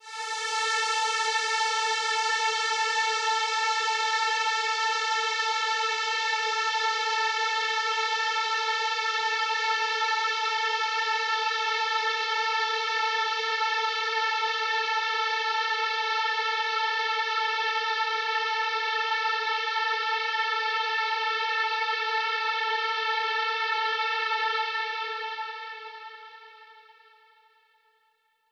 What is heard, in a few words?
Ambience, A; House; FX; Hyper Ambience